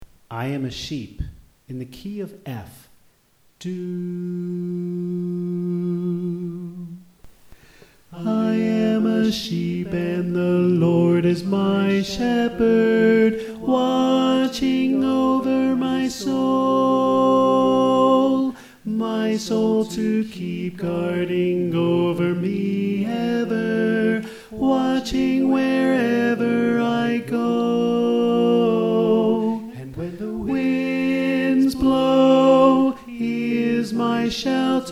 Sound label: Tenor